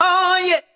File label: "oh yeah" SUNG